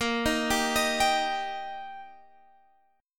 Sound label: D sharp Major, A sharp